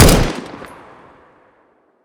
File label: G3sg1